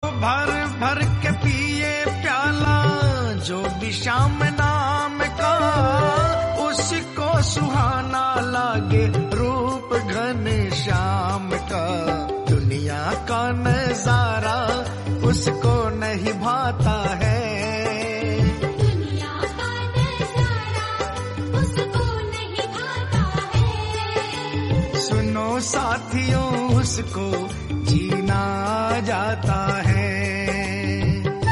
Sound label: Bhajan Ringtone